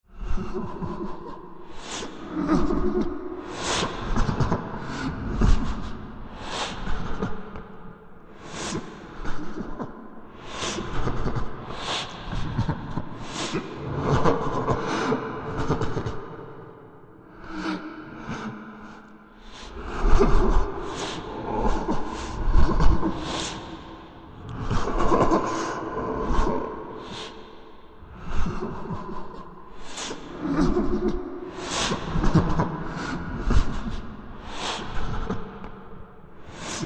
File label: weeping ghost horror sound effect